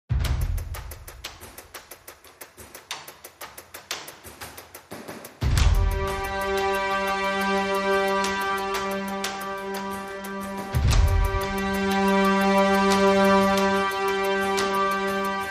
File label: No Staccato Strings